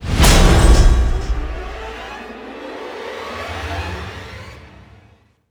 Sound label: Hit